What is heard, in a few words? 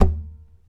TAP, FLAMENCO Guitars